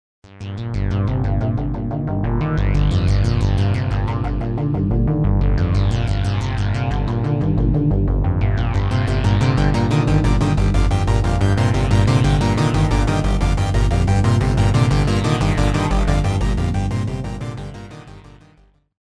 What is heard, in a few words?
seq moog